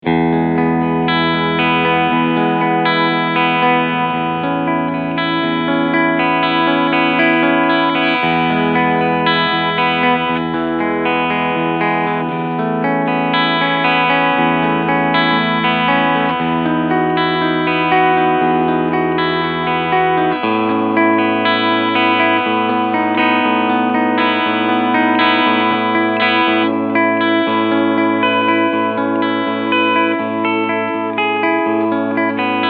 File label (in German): Epiphone LP Cleansound Akkorde; Jared Nichols Old Glory